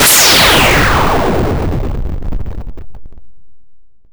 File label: Counter Atk